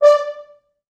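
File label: 4 French Horns, Horns; D4; East West; Quantum Leap Horns Sax; 4 FrHorns MOD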